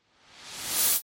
Magic Blast Charge Up; SoundsCrate

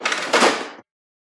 printer opening closing; soundscrate

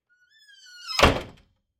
squeaky wooden door slam; soundscrate

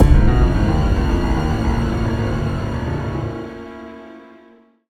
Mystery Ident; soundscrate

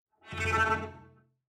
Cello Shivering Tremolo; SoundsCrate

